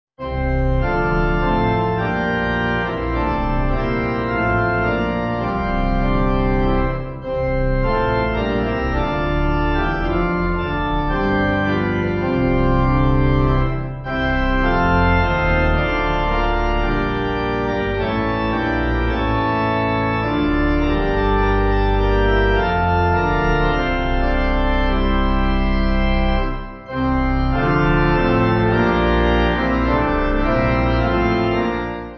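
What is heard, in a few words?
Pipe, Pipe Organ backing; All Praise To Thee; Engelberg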